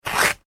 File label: card rip; Card Or Thick Paper Ripping / Tearing; Objects; McKinney Sound